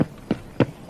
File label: footsteps sfx